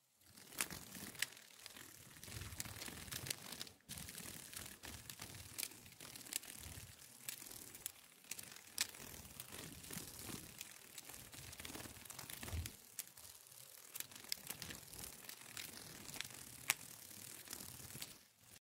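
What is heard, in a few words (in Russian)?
звук бабочки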